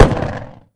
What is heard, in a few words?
backfire